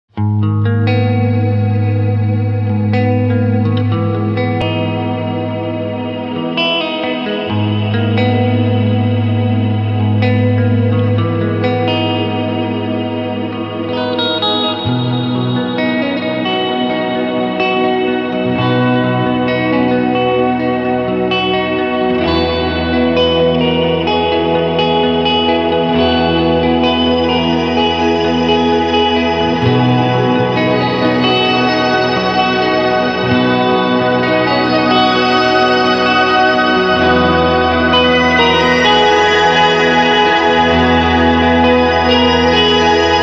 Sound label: Reverb